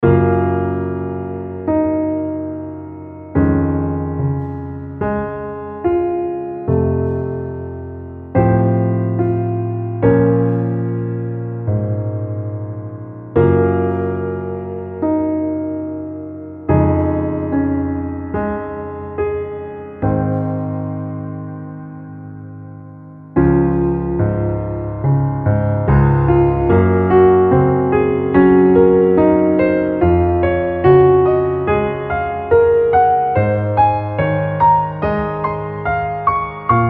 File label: Underscore PIANO; Worship Flow